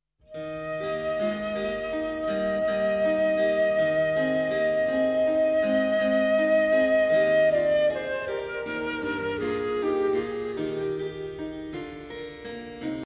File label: Flute